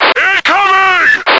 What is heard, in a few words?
chase init; slender; chaosinsugunner